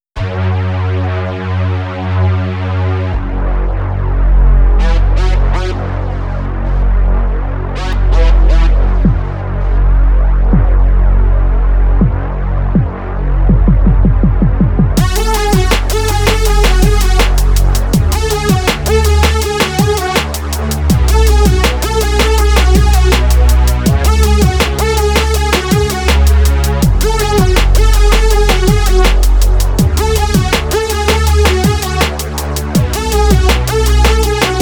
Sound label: synth beat mix; LANDR